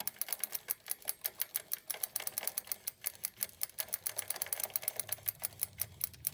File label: gear turning; Drowned City VR; Blueprints; Gears